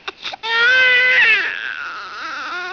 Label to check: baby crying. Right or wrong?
Right